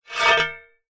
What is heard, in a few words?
medieval weapon metal heavy scrape pick up